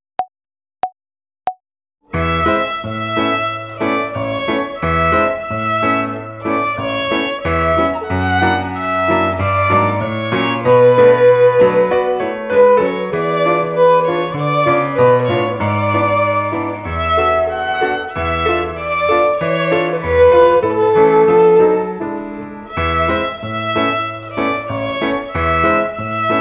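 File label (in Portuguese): Cai, Cai, Balão (Instrumental), Violino; Violino para os Pequeninos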